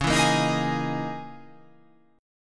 C sharp Minor 6th Add 9th C sharp

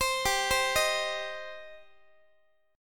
G Suspended 4th Sharp 5th, Guitar; Gsus4#5 arpeggiated; x,x,15,17,16,14

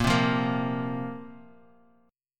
Bb Suspended 2nd Bb down; strummed

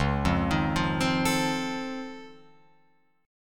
Db Augmented Major 9th, down, Guitar, Open F; arpeggiated; JGuitar

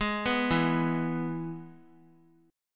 E Major chord; arpeggiated; JGuitar

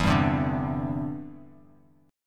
E Minor 7th Sharp 5th E down Bass Standard; strummed

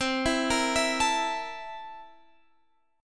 C 13th C

